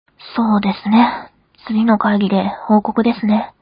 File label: rock